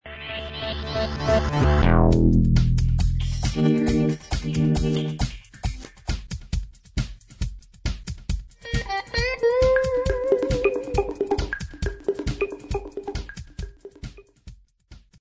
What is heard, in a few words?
Radio Stinger